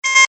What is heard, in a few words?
multimedia rollover, Multimedia Rollover Tone